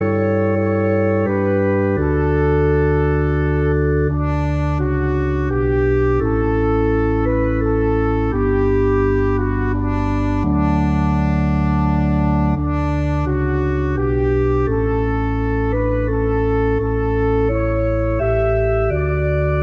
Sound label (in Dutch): instrumentaal orgel